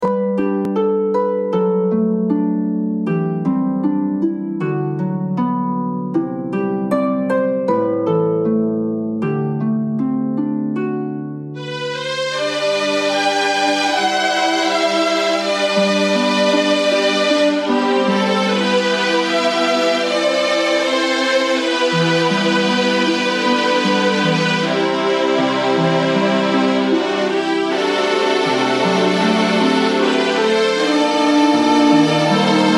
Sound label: Bgm Fantasy; rpg maker mv